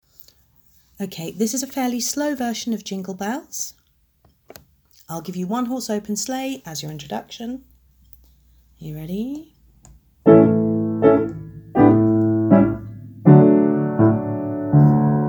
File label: jingle bells 80bpm